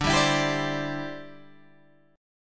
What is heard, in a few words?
D 7th Suspended 2nd Suspended 4th, Guitar Open G